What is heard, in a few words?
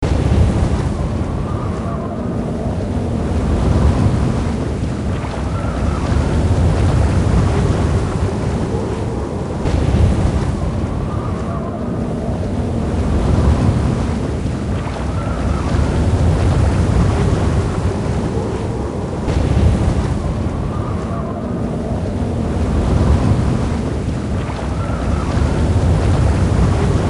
Whirlpool Ambience; The Legend of Mata Nui; Console Games; Game Music